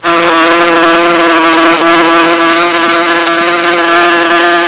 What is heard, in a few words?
Buzzing hornet long